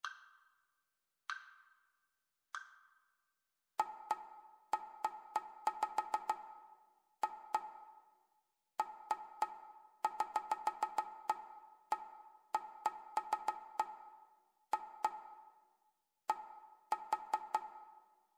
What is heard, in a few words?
rhythmic dictation; ear training